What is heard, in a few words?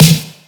acoustic snare d sharp key